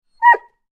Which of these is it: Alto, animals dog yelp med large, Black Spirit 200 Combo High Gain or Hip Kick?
animals dog yelp med large